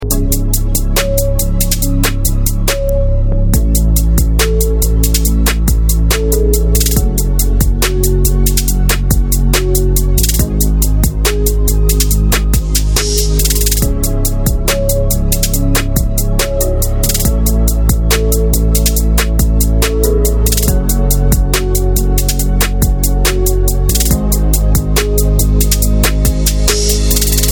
instrumental ringtone

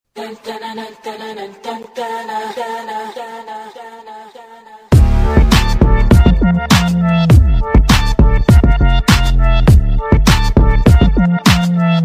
hip hop ringtone

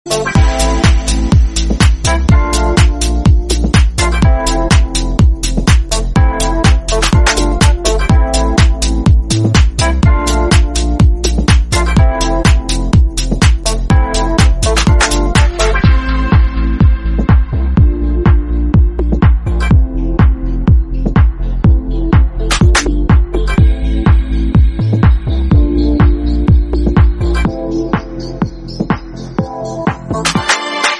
instrumental song ringtone

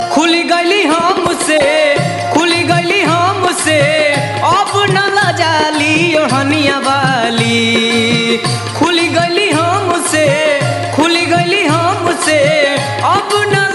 BHOJPURI RINGTONE

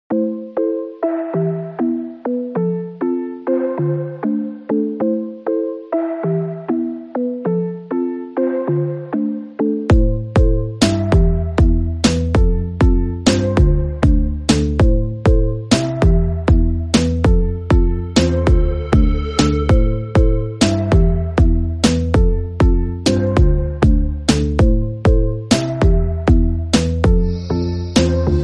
instrumental ringtone

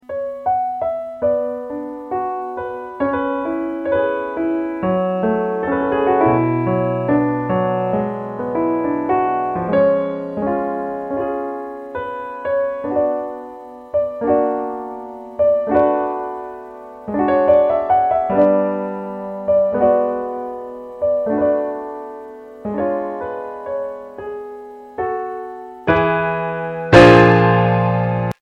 piano ringtone

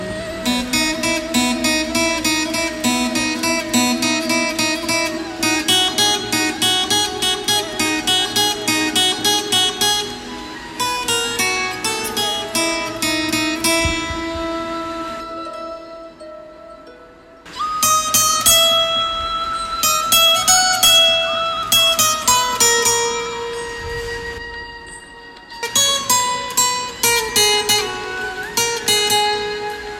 KRISHNA FLUTE RINGTONE

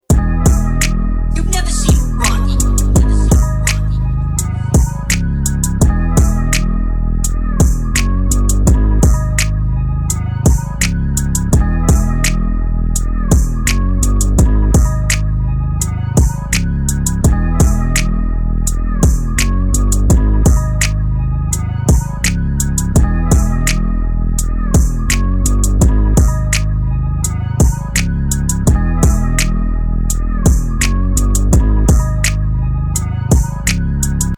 instrumental ringtone

